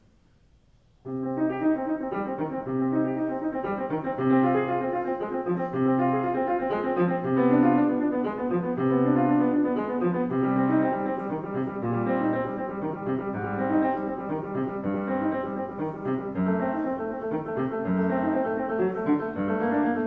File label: Prelude in C minor, piano; 1723; Bach; BWV 999